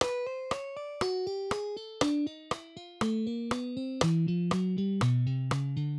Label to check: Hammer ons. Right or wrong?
Right